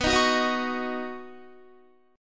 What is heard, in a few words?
B Major Add 9th, Guitar chord; Badd9; strummed; 18,18,18,20,18,21; 4F,4A,5C,5G,5C,6E